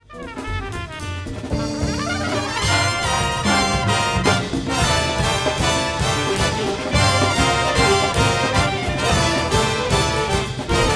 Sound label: Jazz